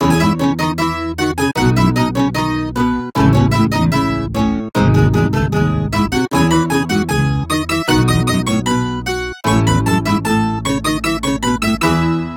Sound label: Mandolin; Sanctuary Score BT3